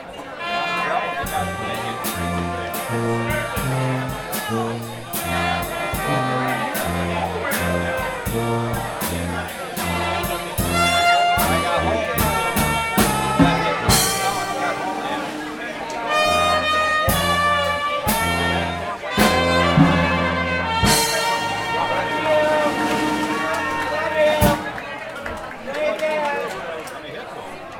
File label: Happy Birthday, Dixieland